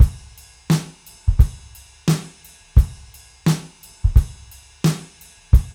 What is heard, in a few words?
DRUMS, Funky Ass Loops